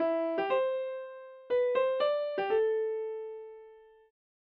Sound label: Success Motif